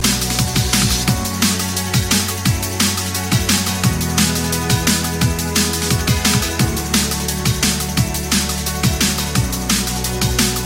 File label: Liquid Dnb